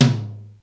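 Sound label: tom low; xr10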